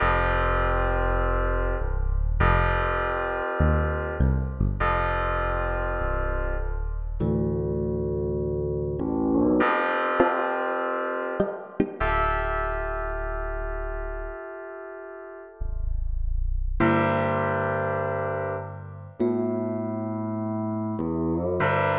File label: drum